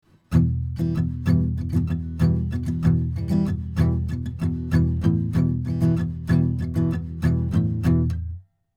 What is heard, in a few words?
AKG414XLS Acoustic Git Deep 20cm 15 Fret